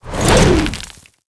Statue Atk

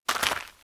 Run; GobleKing

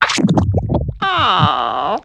Turkey Action; Seer; GamigoNA

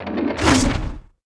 Skel Warrior Atk

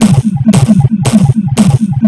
Plasma

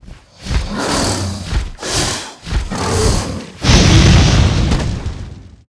Shadow Dragon Action; Seer; GamigoNA

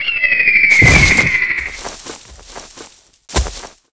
die

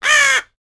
crow; MilesWin

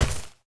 Jewel Tree foot; Seer; GamigoNA

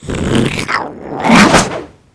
King Slime Skill

